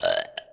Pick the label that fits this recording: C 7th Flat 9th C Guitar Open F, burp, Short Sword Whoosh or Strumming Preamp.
burp